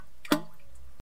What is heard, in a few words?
plop